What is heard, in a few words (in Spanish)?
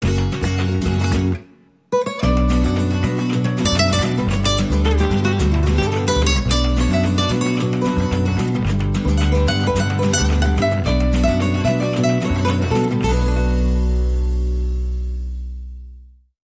Flamenco Apasionado